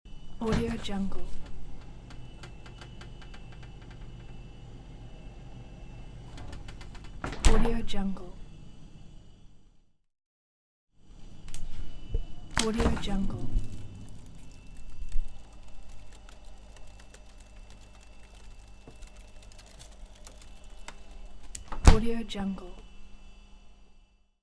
refrigerator and freezer door opening and closing sound